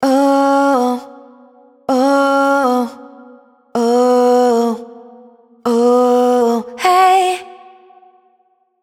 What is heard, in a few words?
riff Oh Woh 128 G#m wet, vocal; Slate Digital Omnivox Vocal Sample Pack